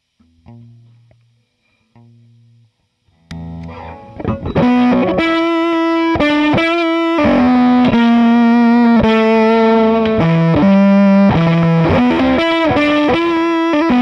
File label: p416b, fuzz